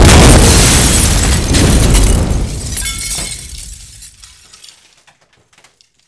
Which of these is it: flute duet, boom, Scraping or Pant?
boom